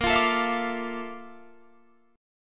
Bb Major Sharp 11th Bb down Guitar Standard, chord; strummed; JGuitar